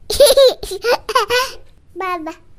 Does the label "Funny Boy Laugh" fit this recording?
Yes